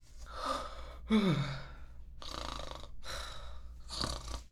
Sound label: Yawning And Snoring